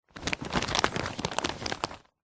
leisure game mat plastic sheet folded movements handling; twister